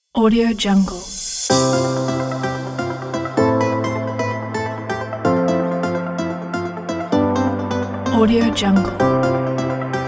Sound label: motion logo pack